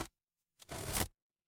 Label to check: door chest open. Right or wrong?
Right